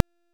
Concrete Archgun Charge Full; Warframe; Archwing

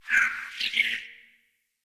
cardinal decoy call; Thawtide

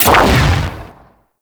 Pistol Explosive Impact; Warframe; Weapons; Sapient Pistol

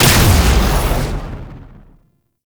Lightning Gun Shot; Caliban Prime Boss; Warframes

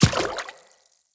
Infested Medium Run Water, Footsteps

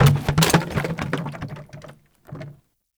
Large Plastic Garbage Bin; Ambience; Gameplay; Warframe; Nineteen Ninety Nine

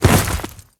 Step Ice, Footsteps; Warframe; Grineer

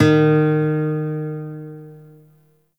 Shawzin Oct One D Sharp; Warframe; Lotus Shawzin

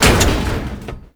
Metal Crate Destroy; Gameplay; Warframe; Tau; Destructible Dynamic

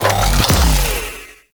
Zanuka Companion Tesla Globe Cast; Warframe; Characters; Abilities

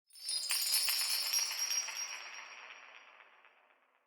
Clan Dojo Small Bell Jingle; Warframe; Dojo Randoms; Ambience

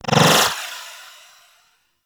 Shield Polarize Cast; Warframe; Mag

